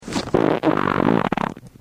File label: fart; Bubbler